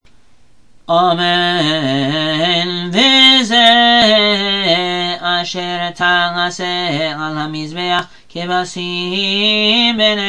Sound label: 6th Aliyah F Minor; Tetsavveh